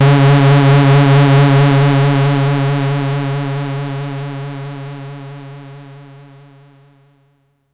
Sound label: destroy you bass C sharp 3, bass; must destroy you bass 2